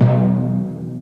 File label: low mid tom sound g key; tom drum samples